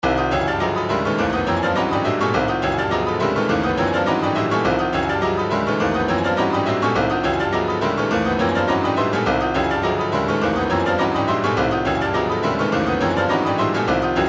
retro funk, midi; crackin

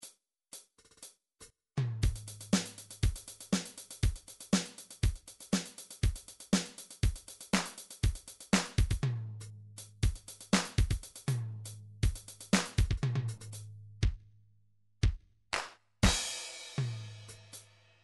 drums dance, midi drums

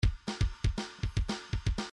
midi grooves rock

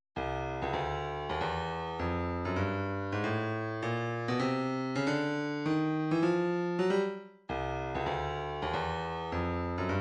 131 snare

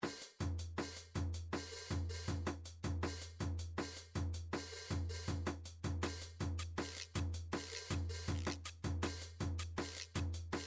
drums gahu

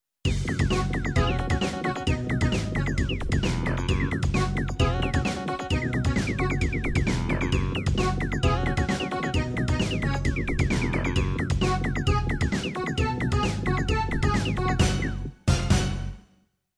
anime chacha

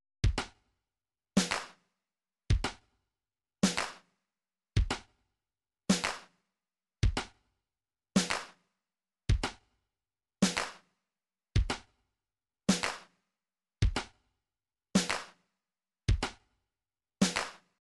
144 tambourine

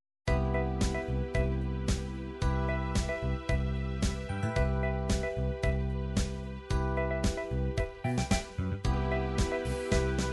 disco, midi

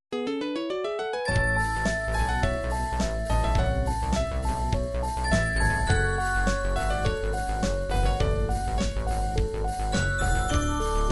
dark pop remix; gameboy; pokemon red blue yellow; route 16

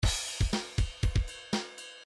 rock, midi

